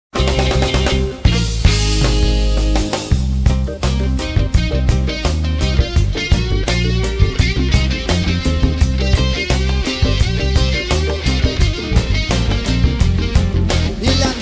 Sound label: Sound system